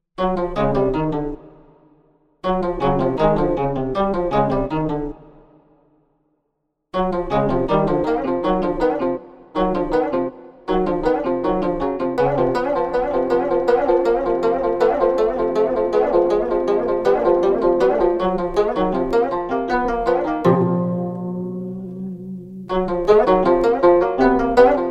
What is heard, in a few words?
18-string gayageum duo; 18현금듀오